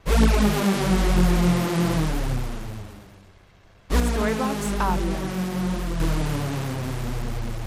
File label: Ambient House Synth 125BPM F